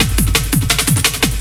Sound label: loop, 170 BPM, drum & bass